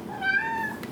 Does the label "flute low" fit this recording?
No